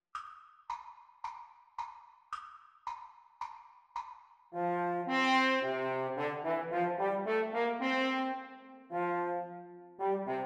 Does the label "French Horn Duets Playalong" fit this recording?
Yes